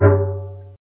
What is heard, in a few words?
bong; Mean Girls Press Kit